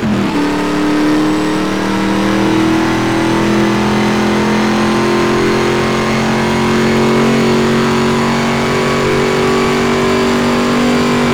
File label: fourth cruise, vehicles cars detomaso pantera; lwcars